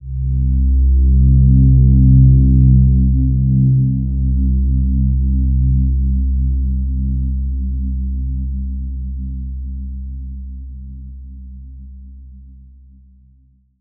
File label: Silver Gem C2 p; healing soundscapes